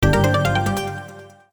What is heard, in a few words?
win; Sakura Fruits